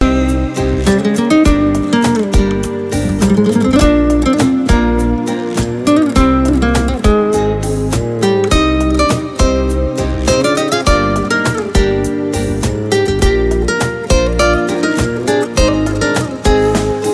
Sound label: Ringtone